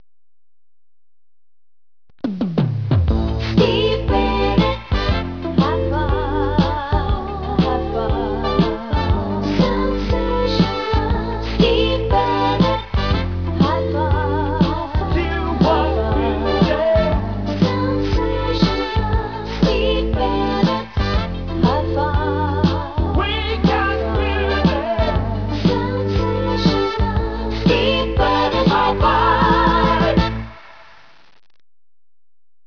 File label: jingle